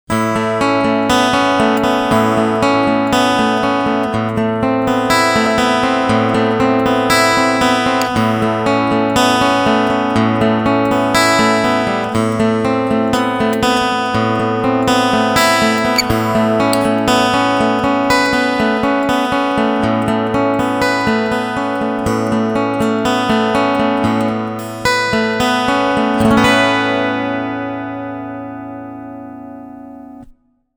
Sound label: Picking CoolTube FULL Highs